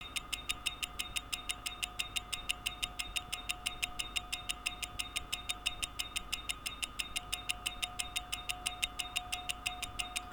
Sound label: Tick Tach Mic Test 21600 Miyota 8215, watch; timegrapher mic for watch enthusiasts